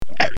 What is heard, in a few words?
slimy monster or murderd sound